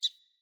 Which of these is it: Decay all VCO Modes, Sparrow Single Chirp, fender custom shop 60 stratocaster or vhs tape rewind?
Sparrow Single Chirp